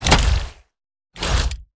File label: pressurizer defend